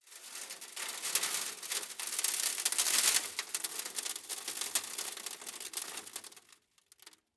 sand; Counter Strike Model Pack; CS:GO; dust2

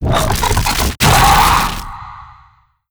vox run warning, zombie revived

